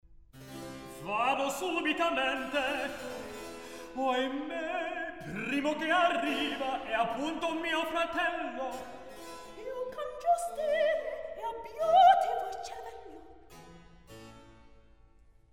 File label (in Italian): Recitativo; Vado subitamente; Mozart; La Finta Semplice